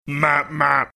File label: singing two notes